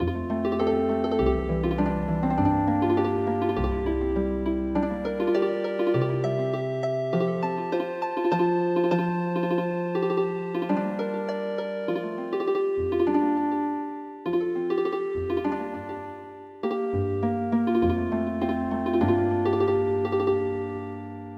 Drawing Madam Healing Harp

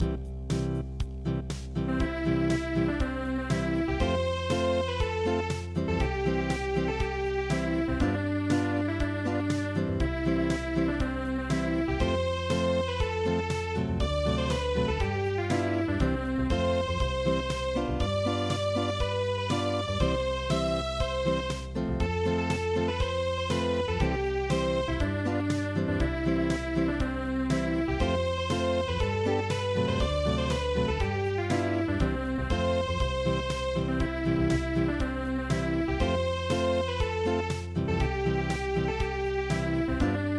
Unplugged

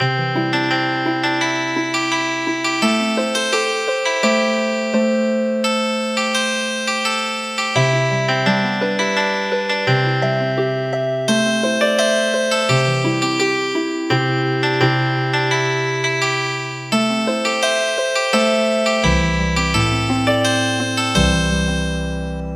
Healin Harp; Red hat white hat

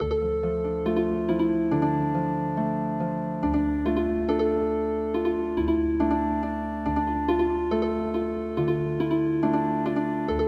Healing Harp

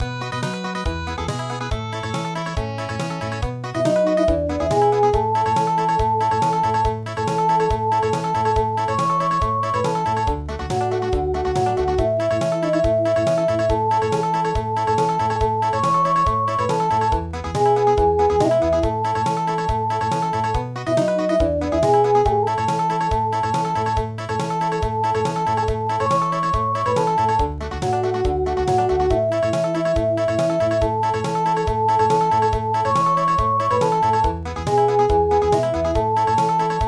Country, traditional; midi

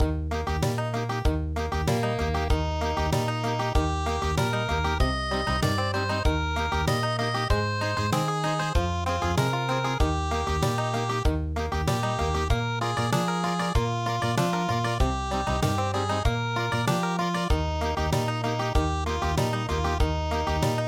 Country; es freit ein wilder wasserman

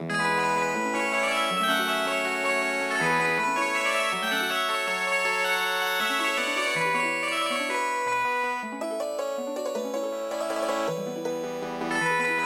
Kurdistan Healing Harp

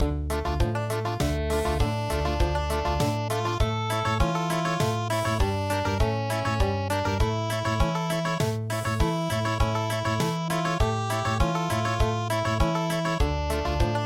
Country, midi; Rozaria In The Mountain

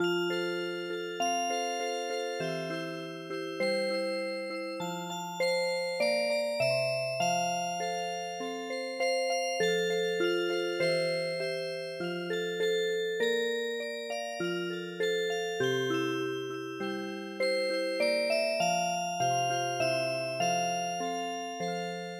Music Box, midi; Hark hark the lark

